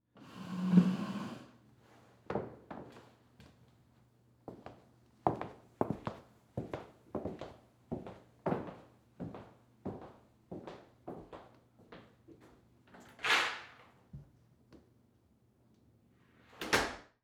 Foley Footstep Cowboy Boots Stand Push In Chair Walk To Door Leave; Foley Footsteps